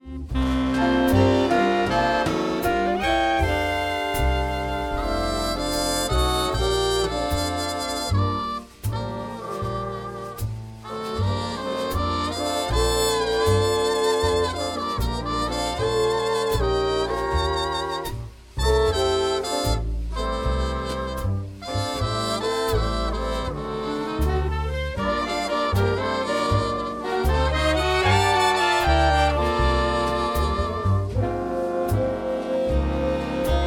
ballad

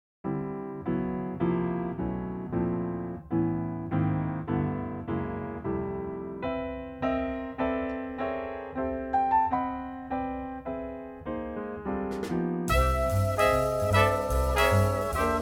swing

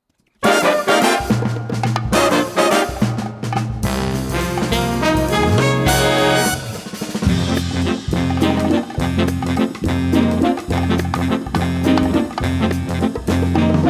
waltz

